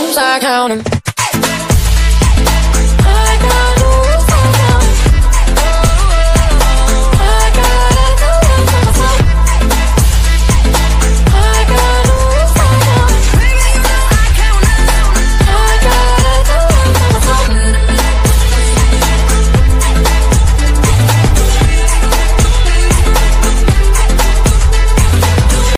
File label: english ringtone